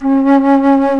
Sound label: WINDS & BRASS